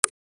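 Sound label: alveolar clicking consonant, human vocalization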